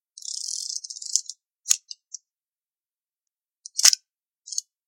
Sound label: exacta